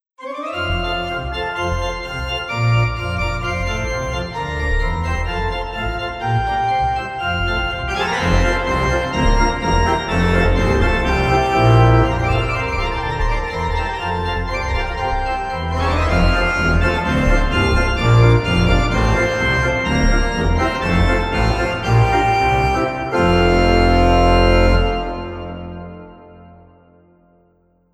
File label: Alle Voegel Organ; VSL